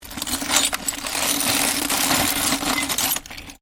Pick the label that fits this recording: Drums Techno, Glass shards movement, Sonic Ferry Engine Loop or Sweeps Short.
Glass shards movement